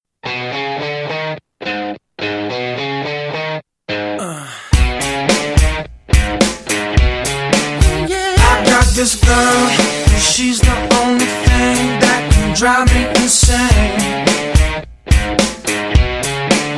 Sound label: music slow, ballroom; audition music